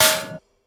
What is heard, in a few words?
whack; Ace of Spades